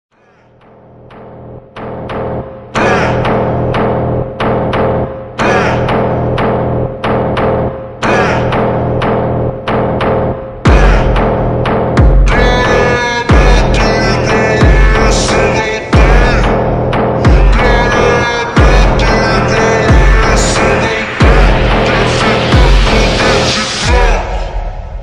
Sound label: very slowed reverb